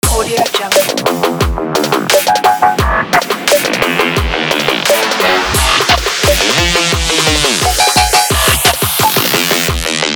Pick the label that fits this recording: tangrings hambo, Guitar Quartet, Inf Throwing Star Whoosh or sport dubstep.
sport dubstep